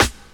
dirty hip hop steel snare drum a sharp key, acoustic snare tuned to the A# note